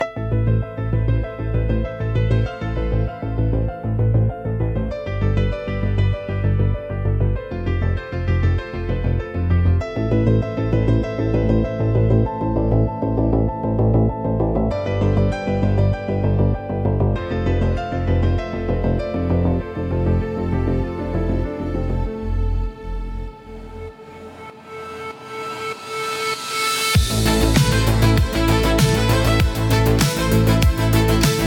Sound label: suspenseful synthwave for youtube intro piano danceable